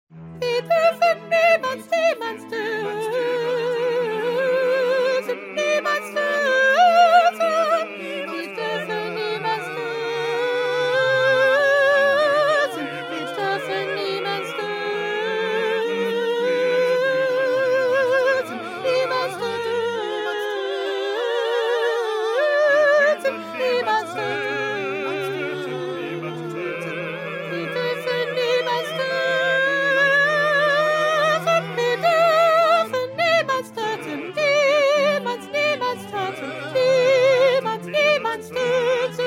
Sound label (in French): PETIT CHOEUR SOPRANES